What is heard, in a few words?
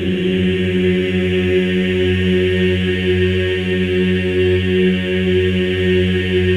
F#3, Symphony of Voices men; MEN EES OCT